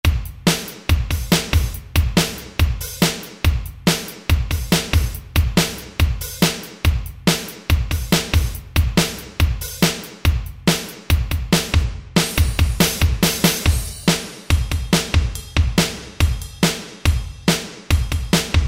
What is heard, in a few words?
Rock, Alesis SR-16; Preset Patterns